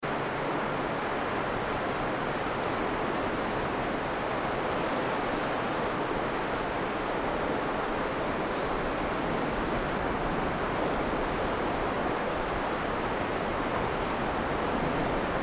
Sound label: river